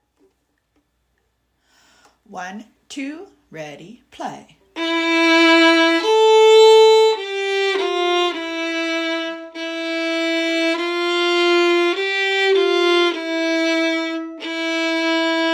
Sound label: Moaz Tsur Harmony